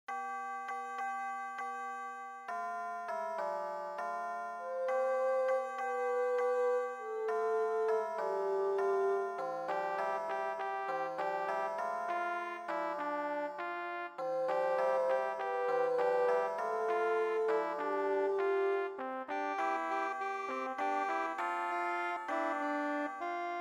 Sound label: MIDI